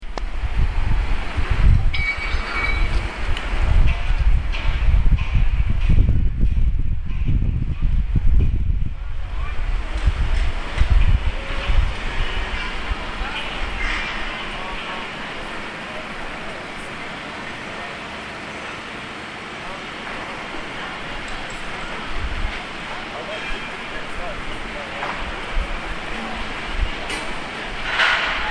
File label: clatters, street recordings